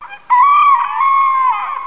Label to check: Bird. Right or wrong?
Right